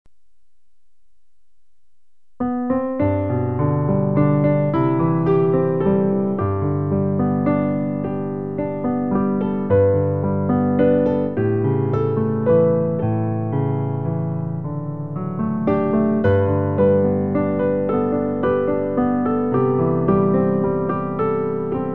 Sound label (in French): tendre pere piano; recueil Louez Dieu